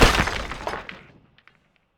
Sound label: Stone Crash